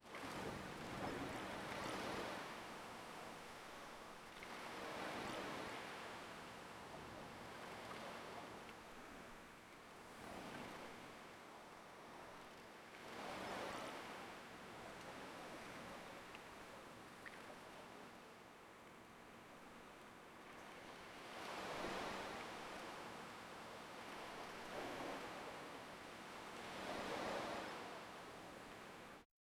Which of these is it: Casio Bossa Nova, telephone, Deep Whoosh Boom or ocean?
ocean